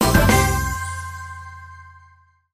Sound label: gameshow chip major